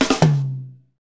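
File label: beats funk, Roland; Northstar - Drumscapes Roland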